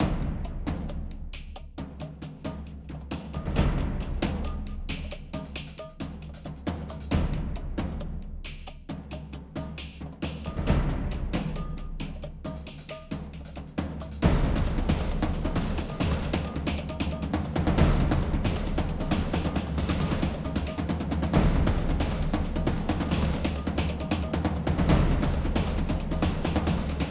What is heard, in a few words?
Orchestra Layer; Chroma Shift